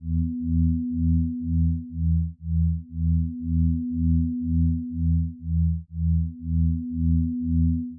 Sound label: battle, music